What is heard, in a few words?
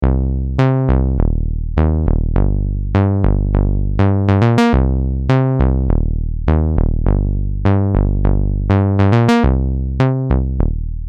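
Bass Synth Dry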